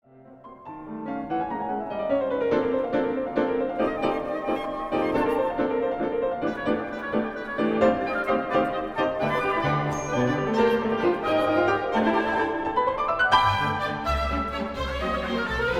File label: Concertino for piano and orchestra